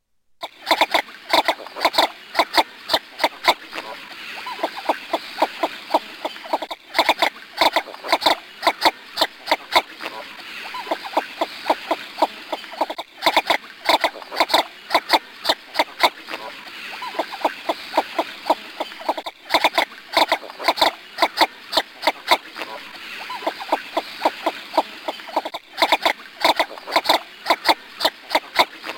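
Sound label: shirokonoska golos, northern shoveler voice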